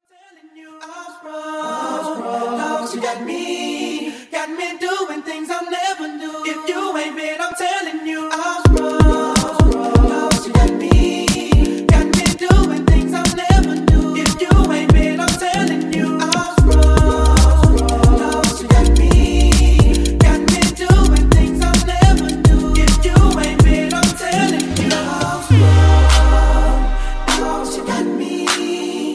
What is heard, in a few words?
DanceHall Remix Acapella IN Out Transition 128 100Bpm